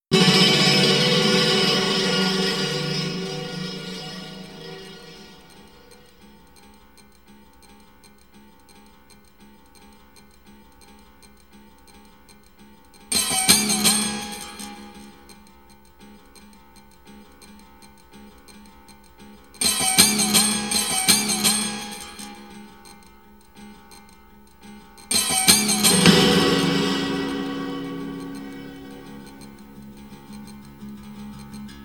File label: Sforzato Piano